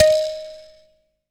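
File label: kalimba D#4, ETHNIC; AKAI S-Series CD-ROM Sound Library VOL-8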